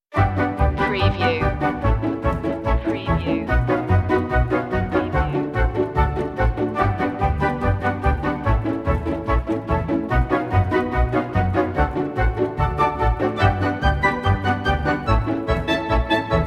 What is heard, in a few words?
strings woodwinds